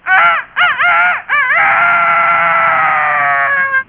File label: rooster, ringtones; Crows